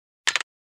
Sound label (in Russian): phone